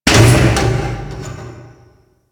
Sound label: Settlement Machine One Off; Ambience; Warframe; Grineer Settlement